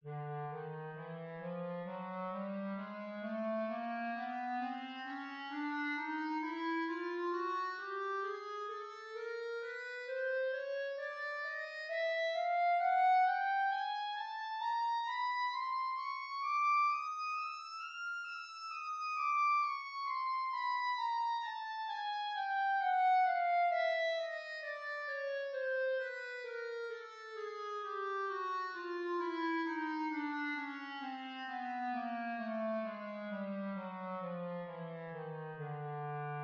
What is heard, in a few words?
Clarinet Chromatic Scale